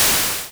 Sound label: hit